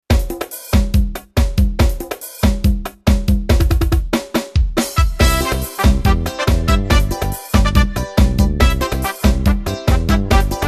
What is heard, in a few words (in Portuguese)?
ritmos yamaha arrocha swingado